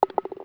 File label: Ball In Hole, Yamaha DD-35